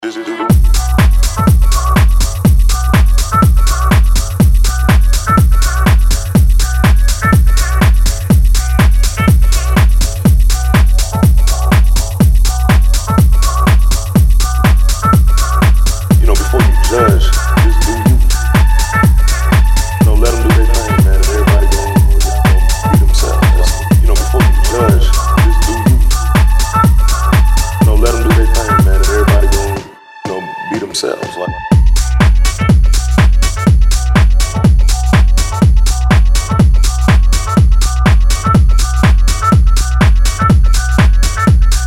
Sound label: Beat Dub